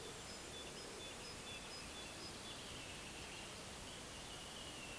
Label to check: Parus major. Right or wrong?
Right